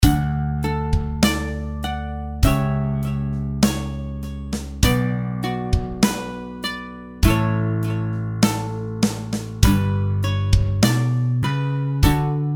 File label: delay